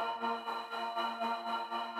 floor switch; puzzle

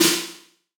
Snare Verb H3500, drums

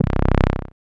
Galactica, C1, Synths Multisamples; Ableton Live 12 Lite; Core Library

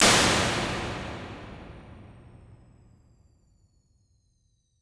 Plates Plate C, impulse responses; Ableton Live 12 Lite

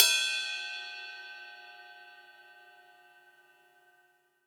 Ride; Drums; Ableton Live 12 Lite Core Library

